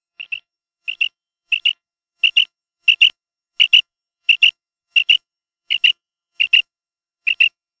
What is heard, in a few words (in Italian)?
quaglia, birdsound